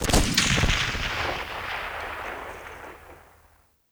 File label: grenade launcher echo